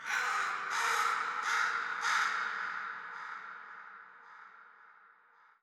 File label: crow, ambient, nature, birds; Portal 2